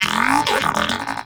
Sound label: Moa Pet Agree; Pets; Warframe; Tenacious